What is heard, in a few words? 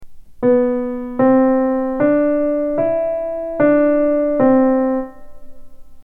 pitches only